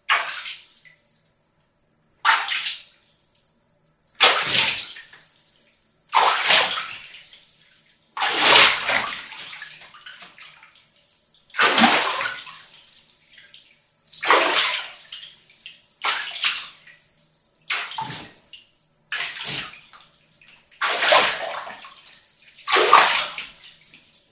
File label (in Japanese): お風呂のお湯で遊んでる音